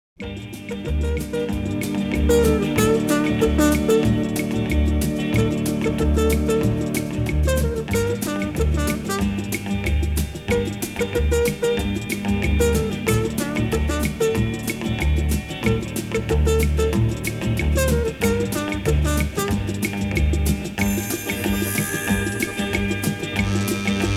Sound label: Minor Melody